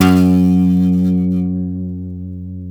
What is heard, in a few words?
G 2 MARTIN 6, Guitars; Spectrasonics; Hans Zimmer Guitars VOL-1